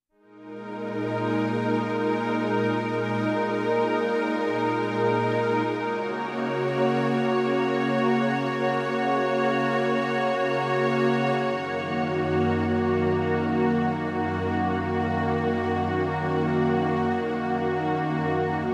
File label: Minor Synth String Chords; Beds